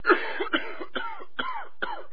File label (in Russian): kashel muzhskoj suhoj